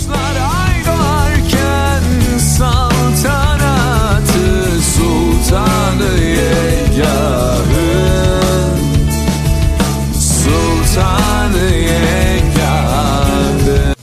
turk zil sesi

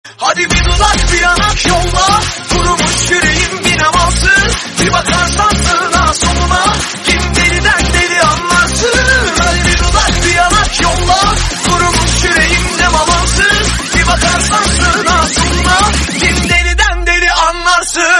yalaa turk zil

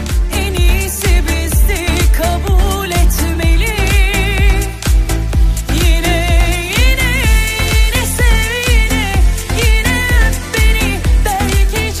turk zil sesi

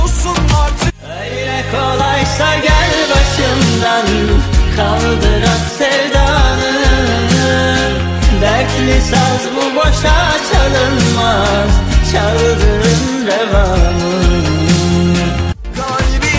yeni turk zil sesi

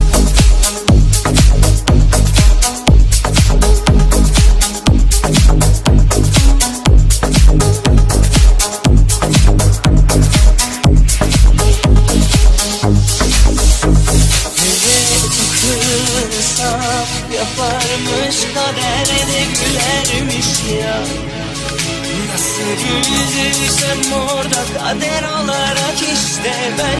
en iyi tuerkce zil sesleri

